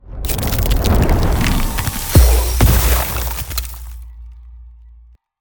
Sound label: Ice Spell Power Up Invincibility; Power-Ups; Arctic Aura